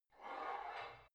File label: barbell heavy plate pull out reverby; sport freizeit; fitnessstudio